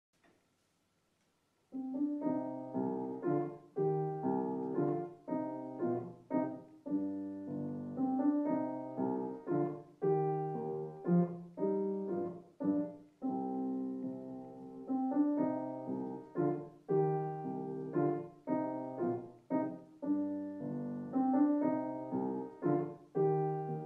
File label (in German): Kleiner Walzer Deutscher in C Moll fur Klavier